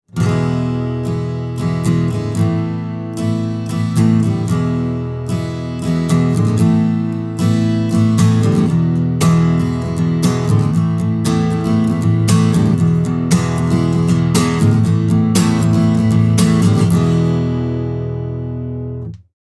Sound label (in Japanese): 生音 ストローク